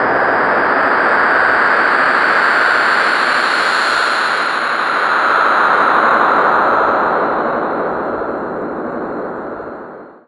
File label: SPACE-FX